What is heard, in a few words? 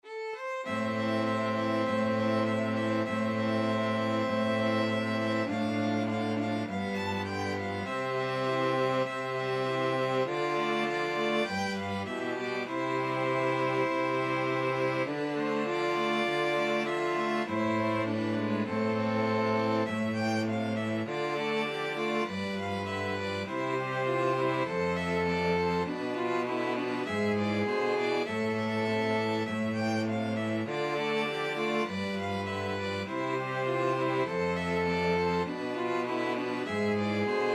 string quartet